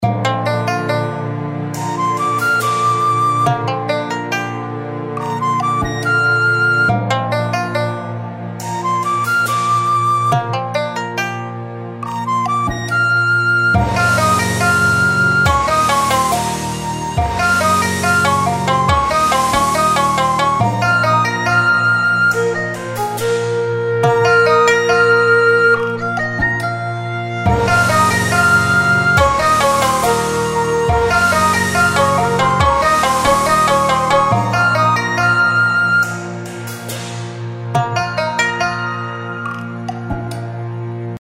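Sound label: reel spin background, white snake legend; Legend Of The White Snake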